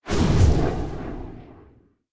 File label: Fly By; Megacity